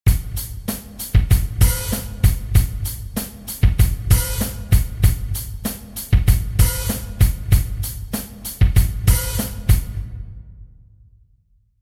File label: groove